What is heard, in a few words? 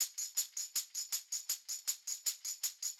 80 Beat Just Tamb